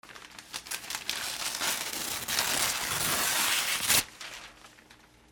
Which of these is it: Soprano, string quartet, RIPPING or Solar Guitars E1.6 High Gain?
RIPPING